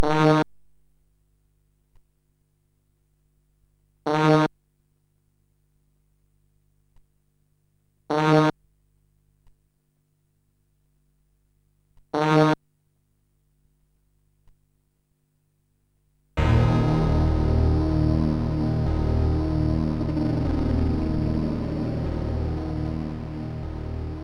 unusual fanfare; MusicLine

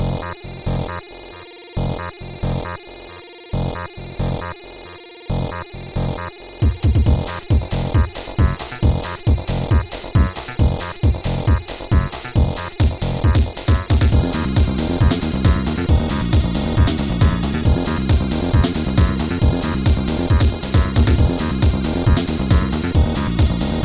mod noise no life, chiptune

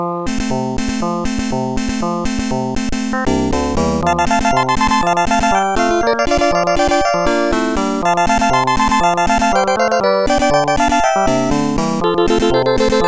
country song; The Music Studio

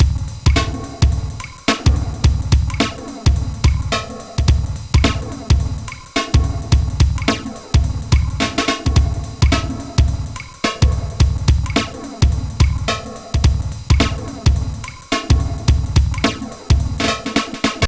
bass o drums

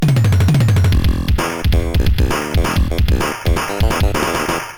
jingles; Custom Modules; ThunderBlade

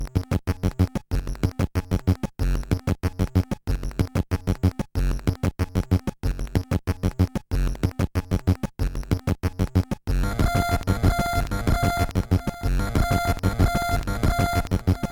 mod; robot vs mantra

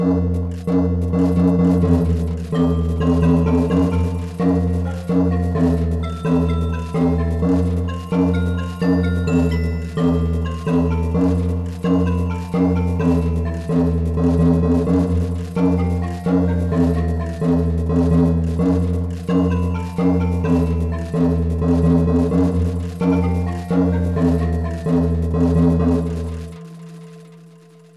european female; Deluxe Music Construction Set

